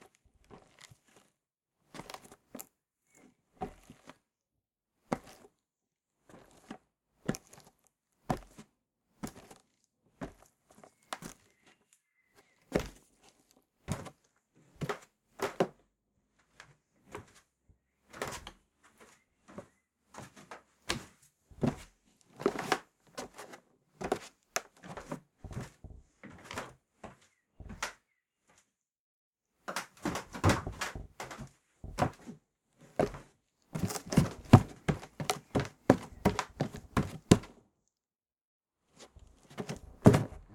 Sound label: Shed Floor